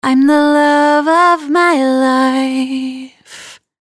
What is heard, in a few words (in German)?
Vocals unbearbeitet